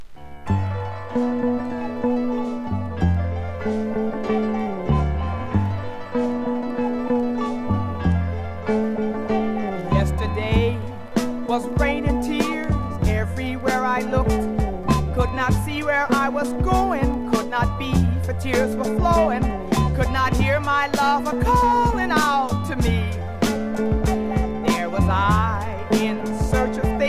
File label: laughing sad song, lp